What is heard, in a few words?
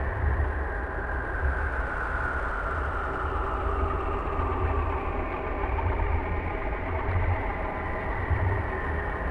shroud creature corrupted layer; Stellaris; utopia